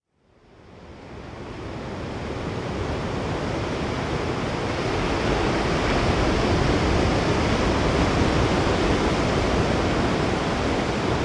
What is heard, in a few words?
Pacific Ocean Majestic Ambient Sound; 1hr